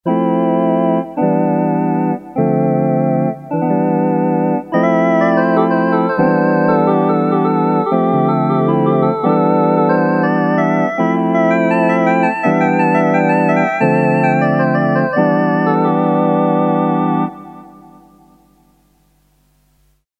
RS370, Polyphonic harmonic generator, Drawbar Organ; Track 3 'Drawbar Organ' Use of the RS390